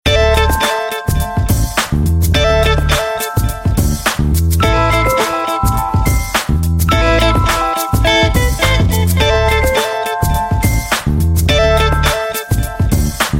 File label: xperia s ringtone